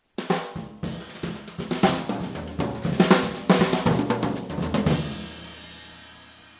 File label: cool, brushes